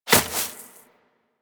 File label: Rodent Hop Step Rear Grass, Forest Rodent Foley Footsteps; Characters; Warframe